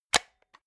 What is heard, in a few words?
plastic remote battery compartment open heavy